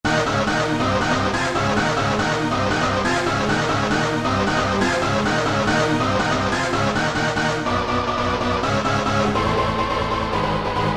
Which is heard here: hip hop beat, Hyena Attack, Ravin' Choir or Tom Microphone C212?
Ravin' Choir